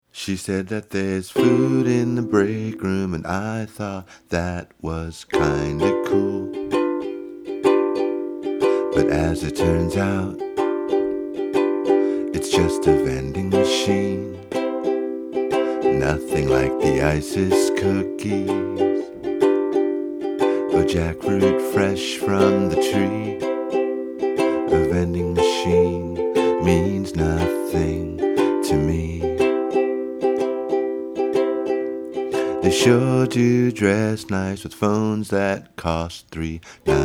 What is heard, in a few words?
vending machine